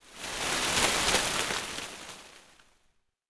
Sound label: Tree Wind; Civilization4; Ambient